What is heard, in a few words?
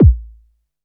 kick, Novation Bass Station 2